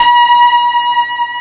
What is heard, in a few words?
ESQ 1 DIGI, Synthesizers